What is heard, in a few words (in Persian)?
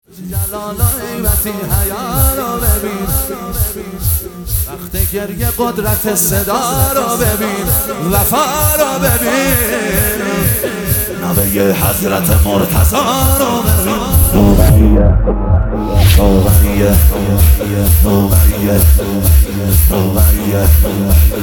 مداحی; احساسی